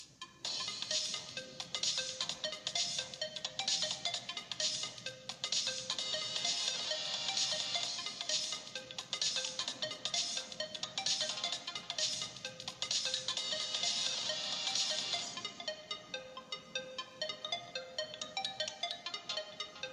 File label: Oppo A71 Ringtone